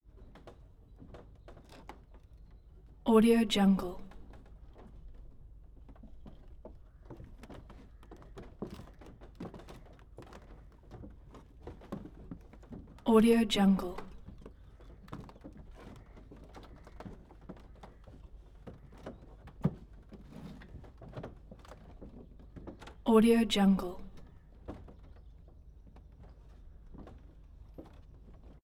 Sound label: wood creak movement footsteps on dock; prosoundfx